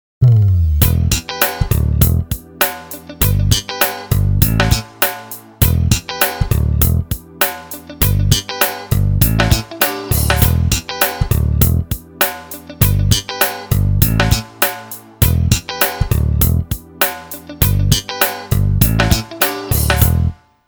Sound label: funk kit